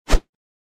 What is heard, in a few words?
axe